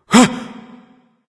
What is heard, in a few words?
surprise; smite; zeus